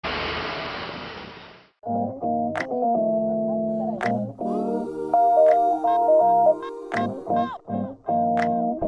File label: bad idea jazz